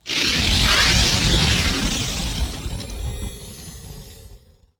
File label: Teleporter Nebula Cloud End; Evolve; Wraith